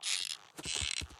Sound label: sfx roll dough; Dorothy And The Wizard Of Oz - Cookie Magic